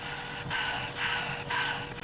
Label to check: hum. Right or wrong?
Right